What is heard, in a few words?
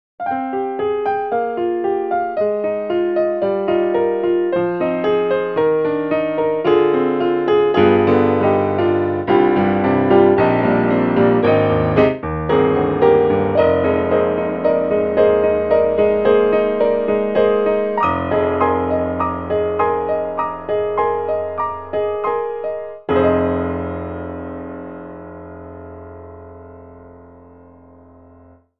Natural Studio Piano; SoundFont2; NS Piano